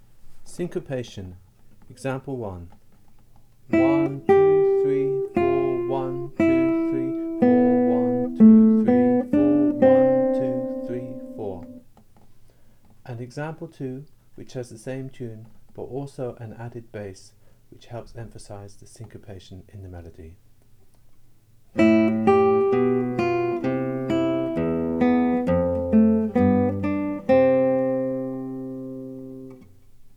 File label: Syncopation